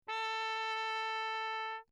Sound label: TRP MUTE Lechner; Perinétventiltrompete; Mutes